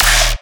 Bass Hit D#, Dubstep; Twilight Bass; Ghosthack; AC2024 - Day 13 - Dubstep Sounds 2025

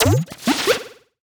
Retro FX; Cymatics; Video Game Sample Pack Vol 1

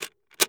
Gear Foley - Cassette Deck Insert; FX; Cymatics; Eternity Sample Pack

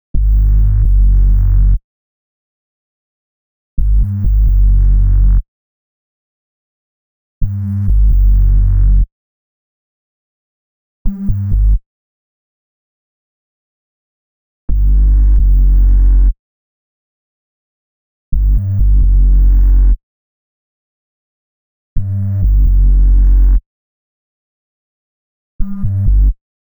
132 BPM F# Min Bass, UK Garage; Melodies; Loop Stems; Cymatics; GHOST (Beta Pack)

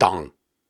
Syllables and Imaginary Words, Viking Vocal, Hard

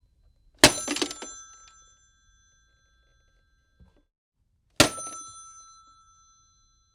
Phone Impact Down With Beep; Fairlight Sound Lib

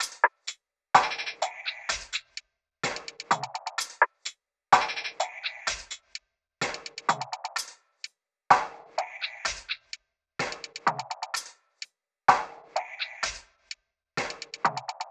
Rattle Perc Loop - 127 BPM; Cymatics; Fiji Hihat Loops & Percussion

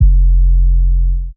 808, F; Drums; One Shots; Humble Hip Hop Sample Pack

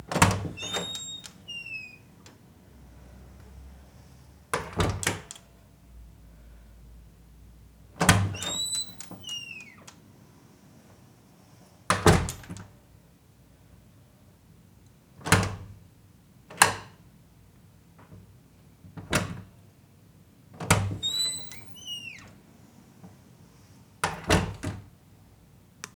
Hollow Wood Door, Open Close x3 with Air; Fairlight Sound Lib

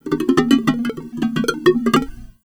toy gadget; Cartoon - film effects

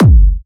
Techno, Kick; Techno Sounds 2024

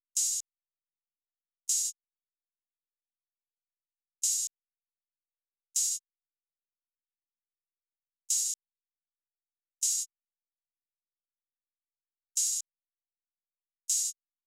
Drum Loop 118 BPM Open Hat; Loop Stems; Cymatics; Vibes II Drum Loop Collection; How So Drum Loop

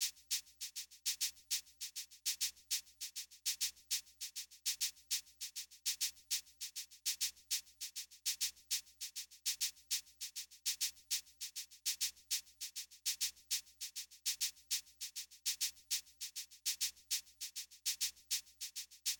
Drum Loop 100 BPM Shaker; Cymatics; Vibes II Drum Loop Collection; Escalade Drum Loop

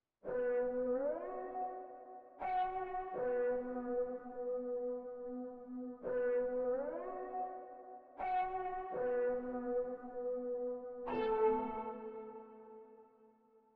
166 BPM B Min Lead, Loop Stems; Melodies; Cymatics; 2022 Melody Mystery Expansion; Midnight In Seoul

